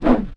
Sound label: kick, weapons